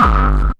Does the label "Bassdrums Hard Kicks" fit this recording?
Yes